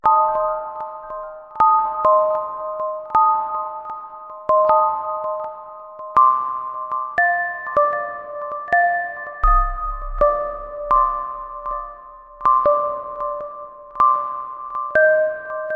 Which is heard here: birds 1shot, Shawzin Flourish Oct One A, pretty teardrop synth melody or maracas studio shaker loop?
pretty teardrop synth melody